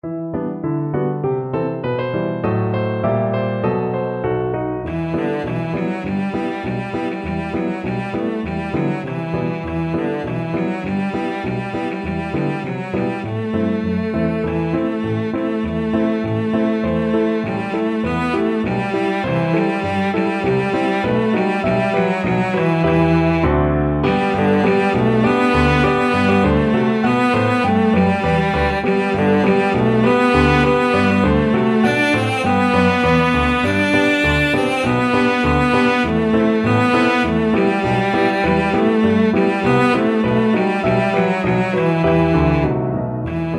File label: cello